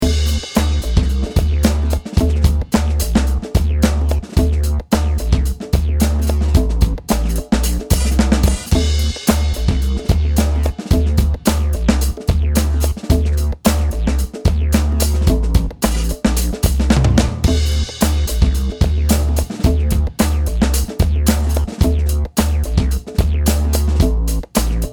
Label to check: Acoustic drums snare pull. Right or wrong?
Right